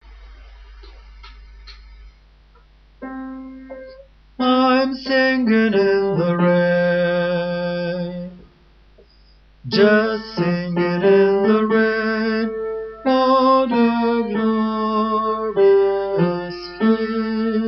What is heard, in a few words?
bass